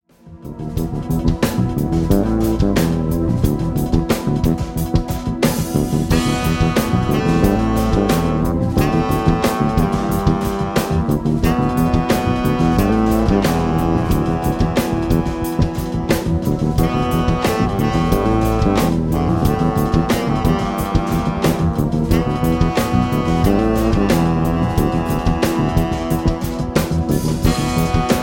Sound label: Live sample; Double Agent